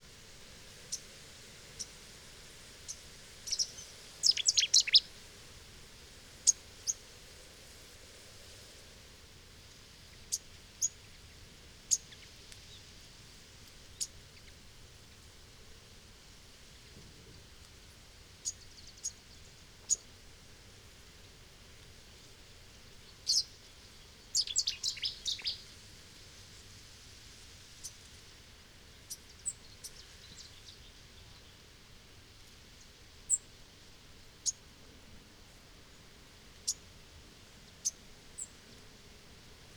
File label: plastic song